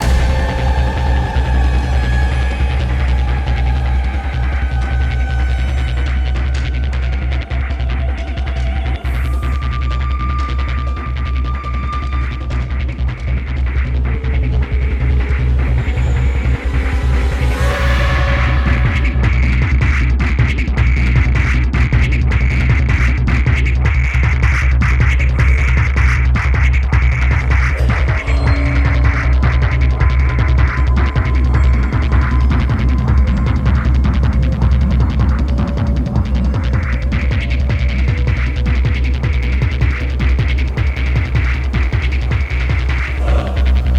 chase; The Monster Plane; huggy spider

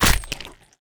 bite, melee; The Monster Plane; kolmods melee

